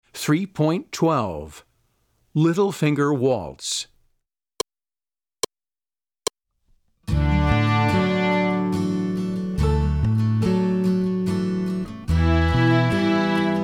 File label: Little Finger Waltz